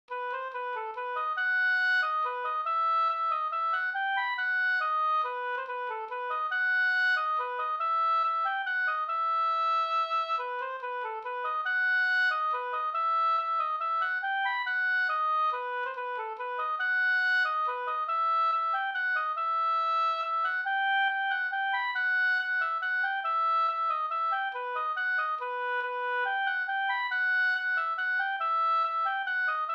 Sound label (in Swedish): vals, E moll